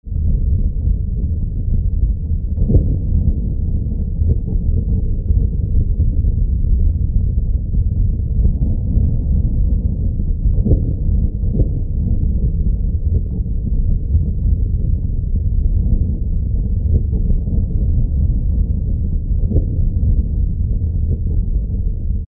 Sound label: earthquake rumbling atmo